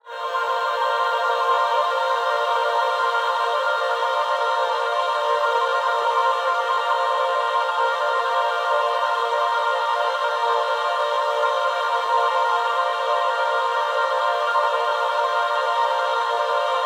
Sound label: Chorus 20Hz and higher